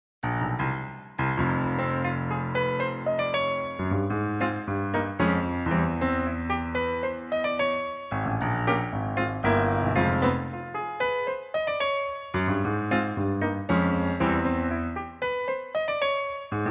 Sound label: piano, Fm